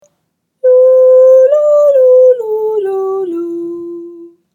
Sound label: Echo Pattern